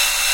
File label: Servo High Little LOOP